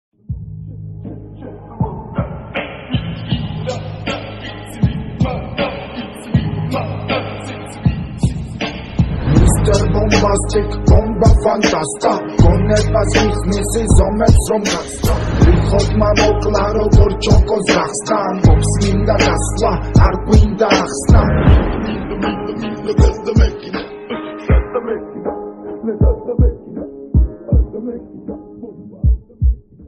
slowed reverb ringtone attitude

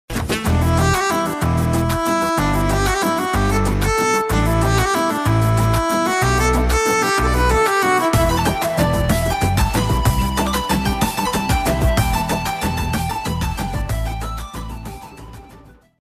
romantic bgm; lawyer papa; nmbk; kiran abbavaram; sanjana anand

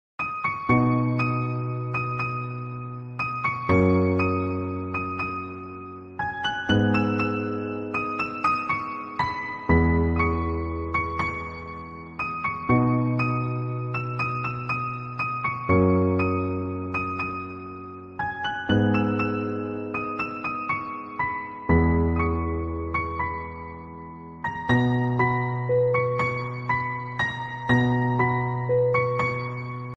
piano instrumental, instrumental ringtone

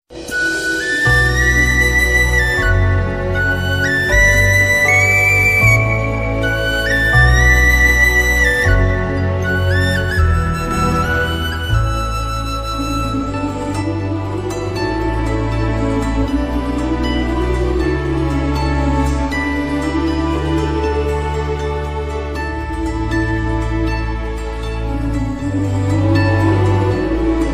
song love bgm ringtone

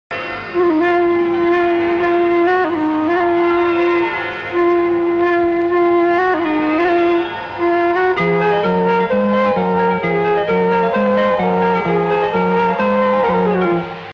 love bgm ringtone; kamalhaasan

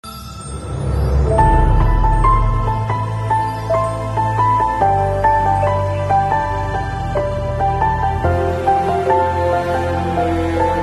ringtone romantic tune

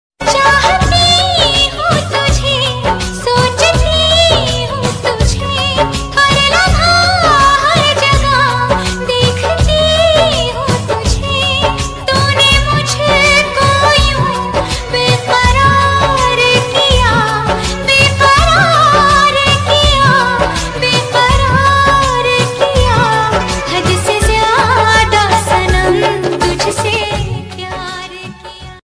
song female version hindi ringtone

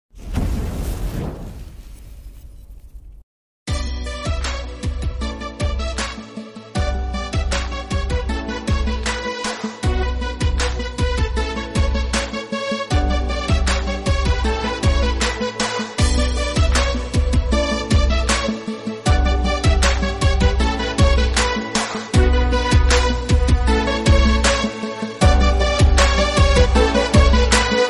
instrumental music ringtone nokia ringing tone

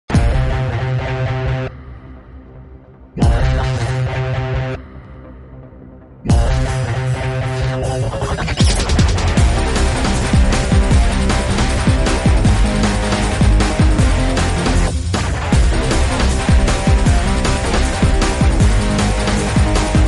background bgm ringtone tamil bgm ringtone; thuppaki; vijay

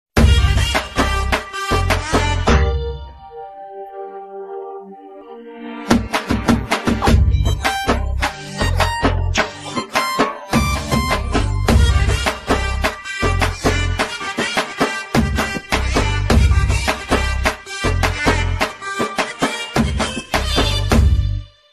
title song bgm ringtone

